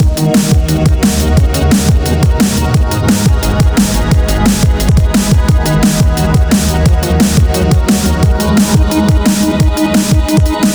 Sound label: boss battle loop; nh demon